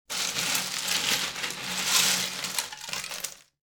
ice cubes; drinks

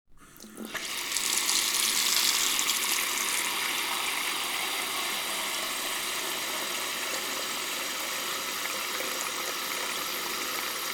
filling sink

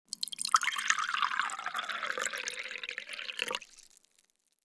pouring liquid; drinks

